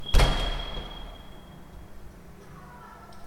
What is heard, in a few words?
door closing hallway echo; sound effects